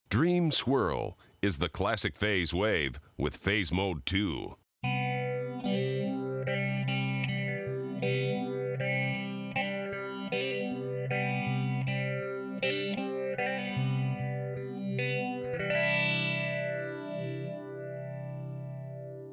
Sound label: Dream Swirl